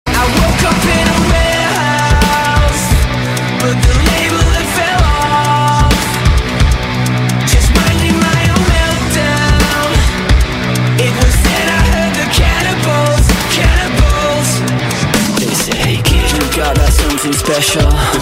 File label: heavy metal